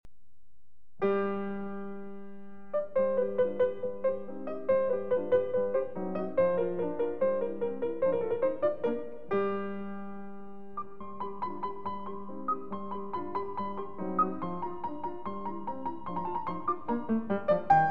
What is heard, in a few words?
Piano Sonata in B-Flat Major; Piano Sonata No. 21; D. 960; Schubert